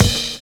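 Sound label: SNARE SOUND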